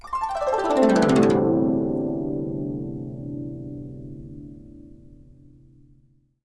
GUCH GLS, GUCHIN; INIS - Opium; GUCHIN-GLS

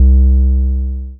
C Bass, DnB One Shots

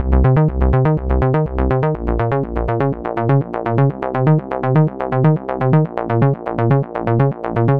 Bass, 123bpm, Nu Dance Electro; Combi Loops; Zero-G; Soundclash; Acidized Wavs; The Arches

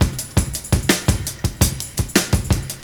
OLD SCHOOL, Breakbeats; USB Soundscan vol.46 - 70 s Breakbeats; AKAI

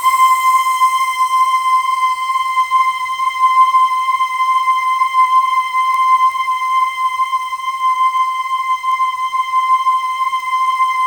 C5, Synth Leads

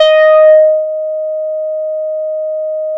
FUZZ D#1; Strictly Synthe1; E-MU Producer Series Vol. 6 – Analogia Project

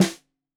Snare 65 Reg Stereo, Deeply Sampled Drums

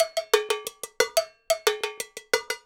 Cow Bell, Latin Percussion

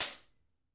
toy tambourine

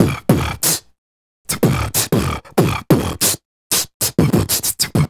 Beat Box, Hip-Hop and RnB Vocals

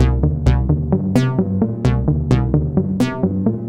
130 MODU Cm, Modular Synth Loops; Polyend; Simulacrum Sounds

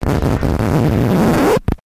icky scratch; Graz

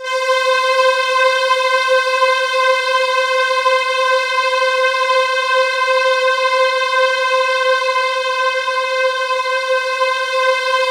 C5, Synth Leads

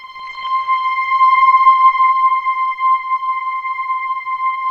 wave C5

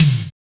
tuff tom; Drums In Your FACE!; NEWJACK

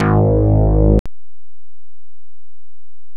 SAX BASS, Synth Bass

